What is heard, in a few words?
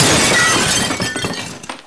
glass; gunslinger